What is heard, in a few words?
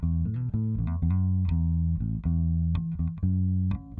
bass; musdb18